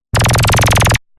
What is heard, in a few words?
Laser Like Synth Rapid Laser; sound spark